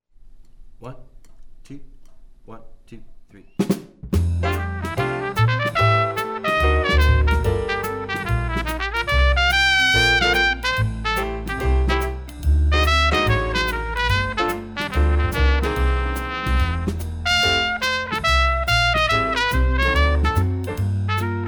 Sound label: swing etude trumpet